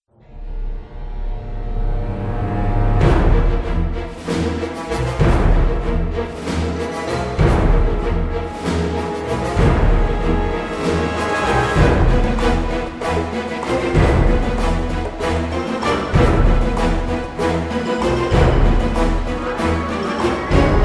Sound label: Combat; Cannery Combat Tank